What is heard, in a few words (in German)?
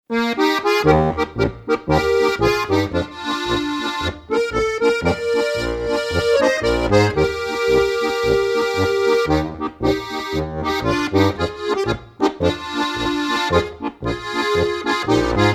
B Es As Des Lied, harmonika